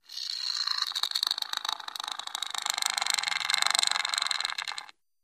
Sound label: Snake, King Rattles. Snake Shakes Its Tail. Close Perspective.